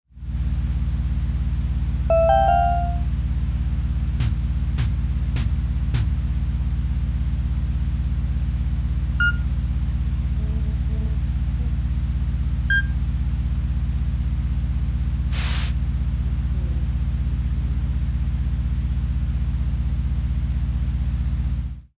convenience store tone

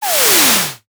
whoosh soft air

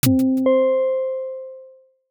logo minimal pulse

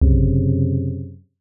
suspense string stab

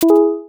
message double ping

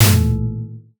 horror sting

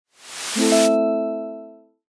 eyecatch memory wash

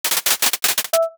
keyboard burst clean

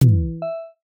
permission shield lock; buttons ui

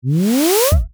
lens zoom tunnel

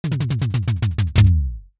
comic collapse rattle

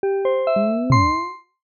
quiz round start

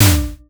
comedy silly knock

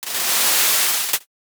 foley tape peel cardboard; daily life

